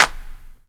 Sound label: CLAP, Dance Drums